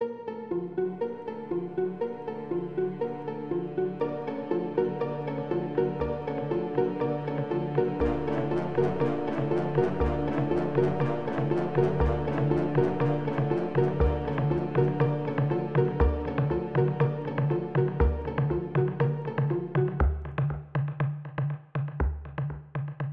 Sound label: Nervous